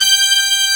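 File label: BRASS G2 left; BRASS#1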